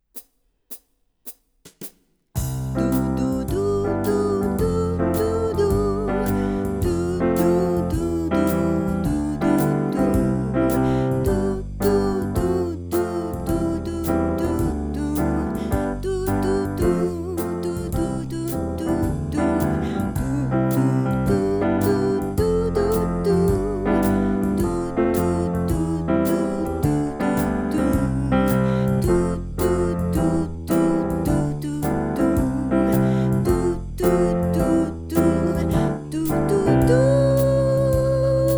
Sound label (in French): instrumental; jeu de creation; ah vous dirai je wolfi; la recreation de mozart